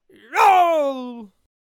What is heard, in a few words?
Bomb on a Stick Attack Vocals